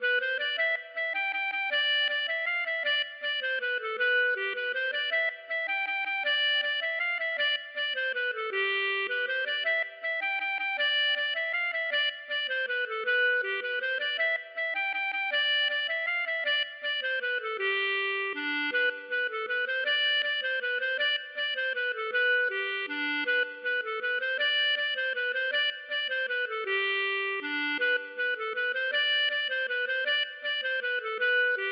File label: mazurka